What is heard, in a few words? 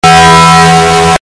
Loud Bong; Taco Bell